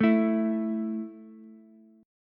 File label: A 5th A down Ukulele, A5 Chord; strummed; JGuitar